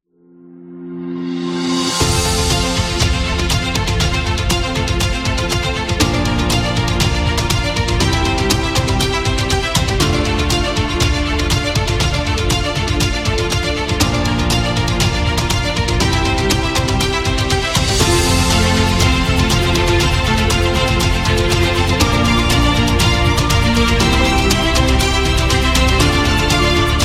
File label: Award Celebration